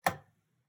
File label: Light Switch Turn On Flicker